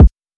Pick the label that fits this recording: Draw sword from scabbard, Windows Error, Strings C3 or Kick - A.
Kick - A